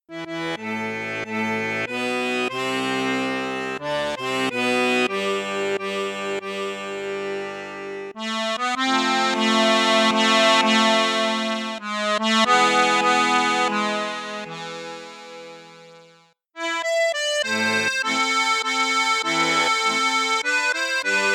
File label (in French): registre flute juste flute haute, accordeon; cavagnolo professionnel 12